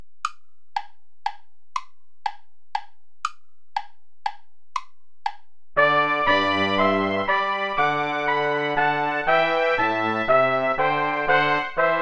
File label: play along without trombone; scholefield cla ment cotterill the day thou gavest lord ended